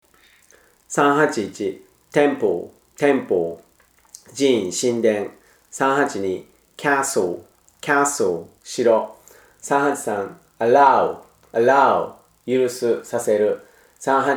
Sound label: tango